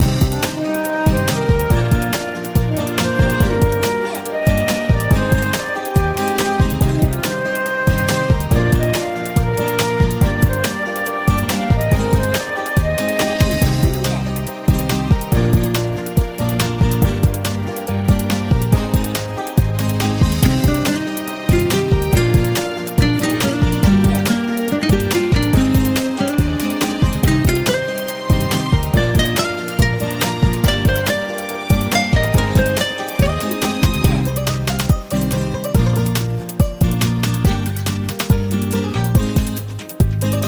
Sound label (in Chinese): 副本战斗